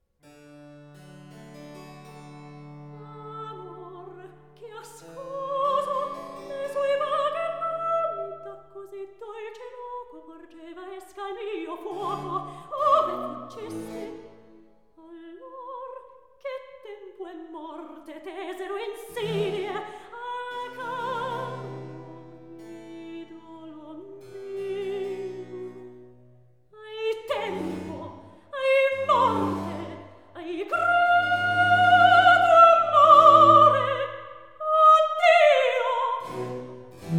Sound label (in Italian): Recitativo; Ero e Leandro HWV 150; Amor, che ascoso; Handel Masterworks; Klassika